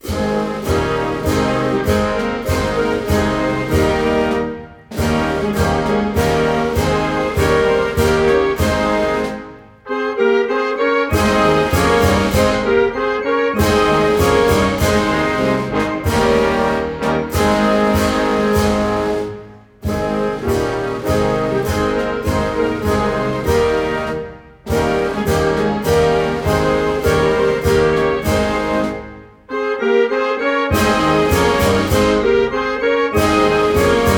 National anthem of Lesotho, performed by the U.S. Navy Band